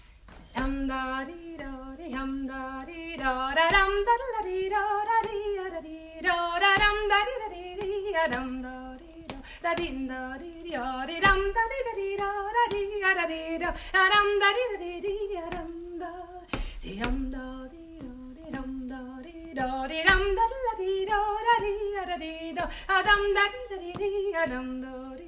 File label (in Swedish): Brudpolska; Farmors